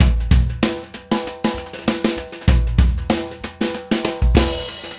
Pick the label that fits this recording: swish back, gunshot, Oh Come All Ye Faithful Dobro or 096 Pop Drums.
096 Pop Drums